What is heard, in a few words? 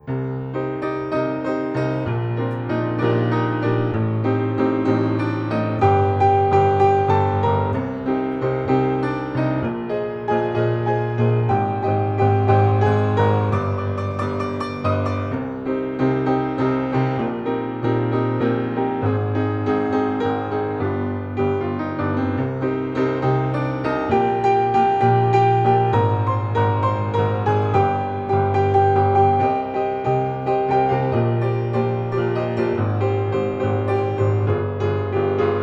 Piano SCX25